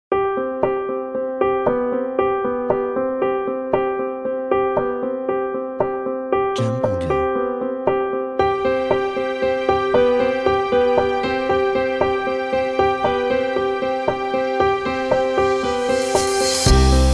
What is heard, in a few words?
Motivate Uplifting